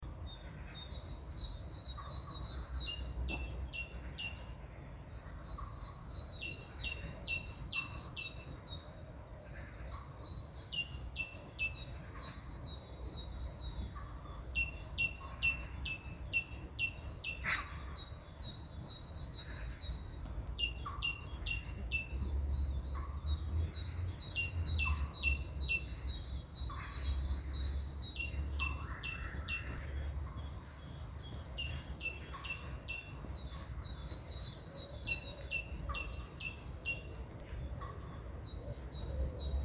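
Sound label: park, spring, tit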